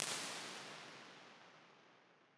Cathedral - long response with slow HF decay

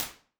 Small Room - short response with quick T60

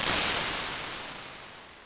Massive Cavern, impulses

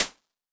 Inside Shower- Door Closed, Impulses

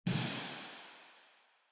Large Tank - long tail, low frequency response

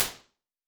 Classroom, impulses

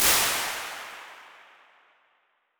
Hall, Impulses

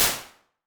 In the Other Room, impulses

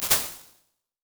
Narrow Spiral Staircase, Impulses

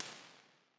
Theatre - complex reflections for depth

